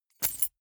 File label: key ring large drop carpet soft; objekte; schluessel